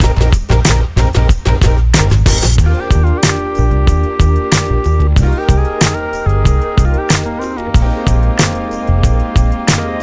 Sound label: 093 Ebmaj, Smooth RnB